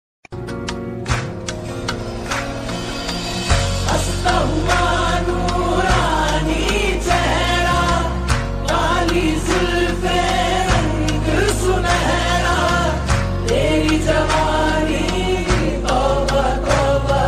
Qawwali version

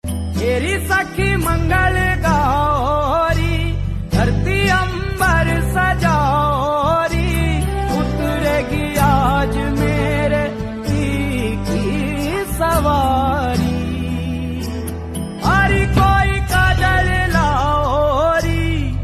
Wedding Song

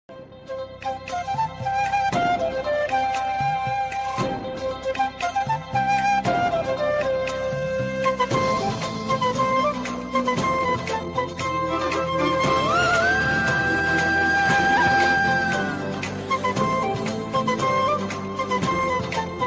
Tv Show Theme Background Music; Colors Tv

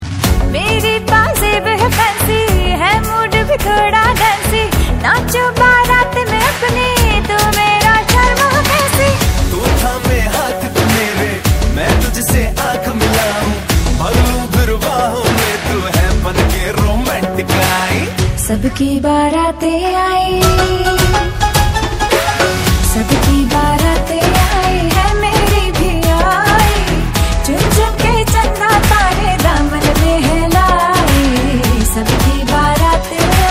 Wedding Song

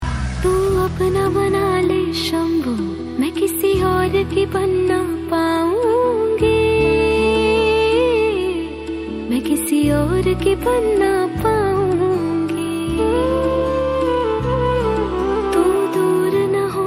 Female Version Devotional

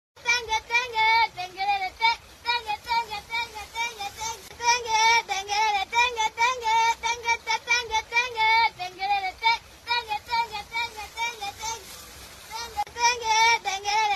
Instagram Trending song

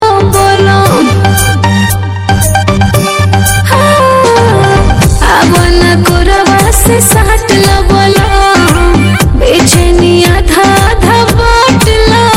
new bhojpuri song

